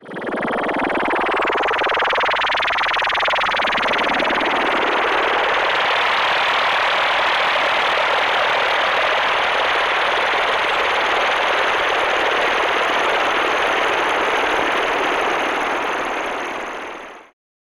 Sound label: Waterfall Crickets; Zystrix